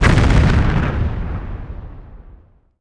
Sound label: explo large